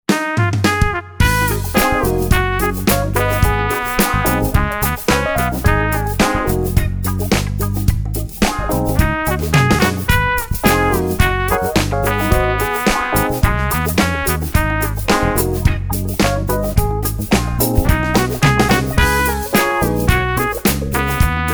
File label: Bright Trumpet